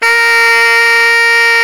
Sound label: SAX T. GROW, Tenor